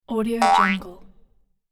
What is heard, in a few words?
jews harp boing short